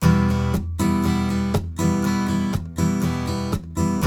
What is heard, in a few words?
RIFF, Blues Guitar; ILIO; Fretworks